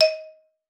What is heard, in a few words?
D#4, xylophone; Xsample VOL-4